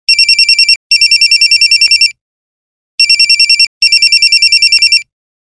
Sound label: Phone Ring; Coolpad 7296s; ringtones